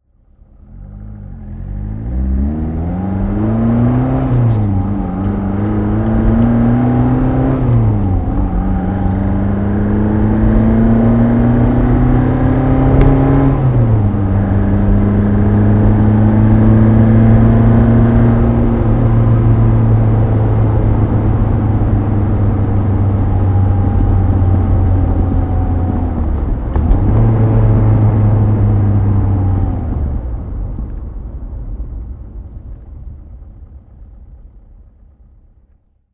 lotus elan S4 driving; Lotus Elan S4 roadster SE, 1968; Engine sound driving; V3D/ ClassicarImages; Marc Vorgers

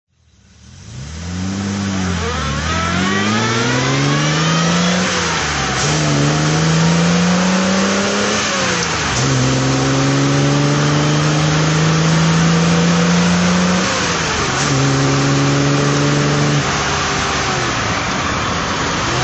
healey mk2a drive

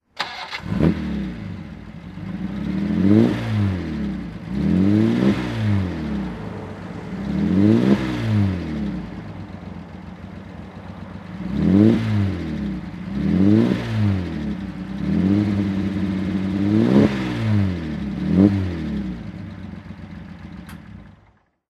bristol 401 throttle; Bristol 401, 1953; Engine sound; V3D/ ClassicarImages; Marc Vorgers

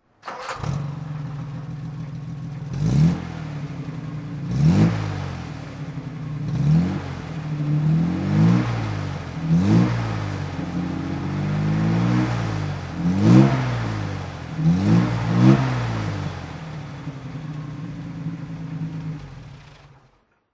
jaguar xk140 ots throttle

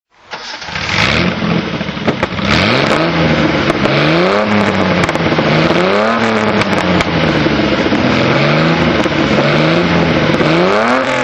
datsun 240z throttle, Datsun 240 Z rally car; 1972; Engine sound; Engine sound driving; V3D/ ClassicarImages; Marc Vorgers

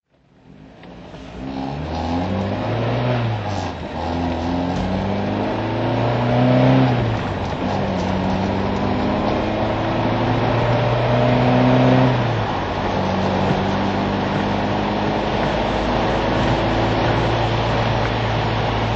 alfa romeo 1750 gtv drive

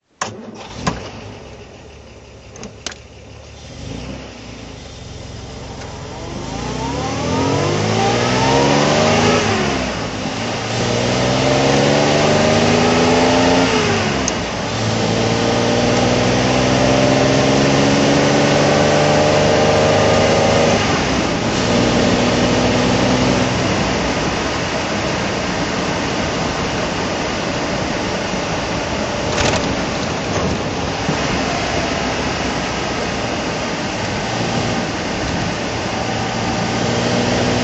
austin healey 3000 mk3 drive

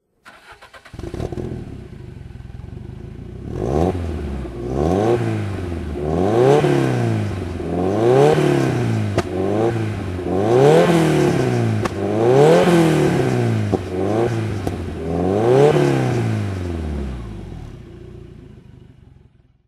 lancia delta HF throttle; Lancia Delta HF Integrale 16V, 1990; Engine sound; V3D/ ClassicarImages; Marc Vorgers

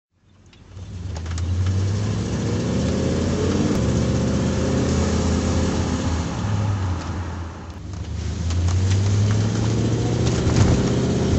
facel vega hk500 drive

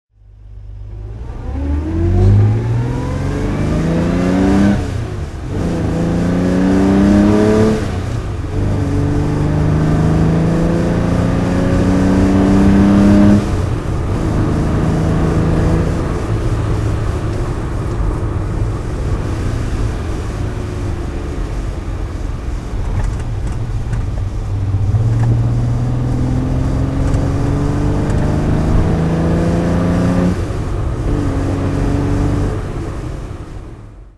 austin healey 3000 mk2 driving

